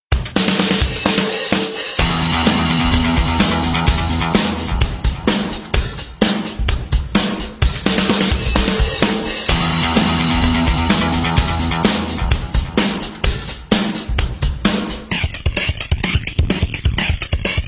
Exciting Hard Rock; ramp car racing ps4 ps5 switch 2023

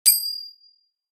Bell

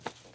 Notebook SelectPaper; Lucius III; pc linux

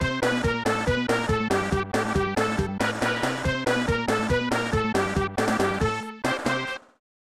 Goal

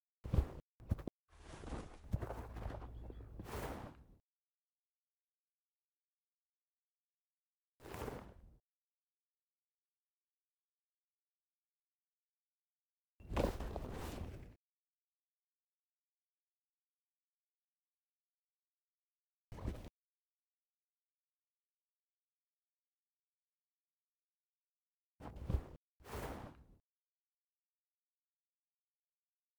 Smartphone; yesterday origins gamerip 2016 switch ps4 pc ios macos

